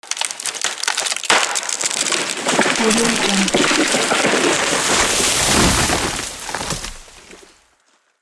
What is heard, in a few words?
tree fall